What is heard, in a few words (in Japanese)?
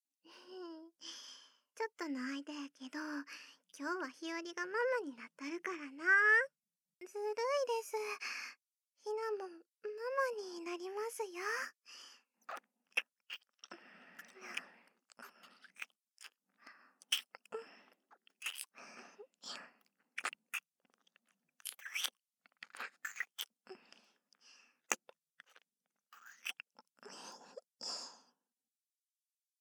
優しいキス添い寝(BGMなし); ひよりママ＆ひなたママ; 左右反転